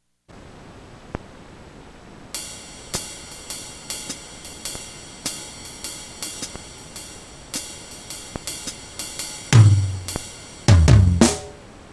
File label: LO-FI JAZZ, Roland SC-880; Factory Patches